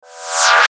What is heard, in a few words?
Sweeper Metallic Hiss Zap; Production Element Imaging Element Accent Transition; Sweeper Sound Effects